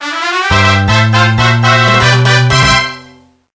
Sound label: Level Up, The music; ISDS; Mario Series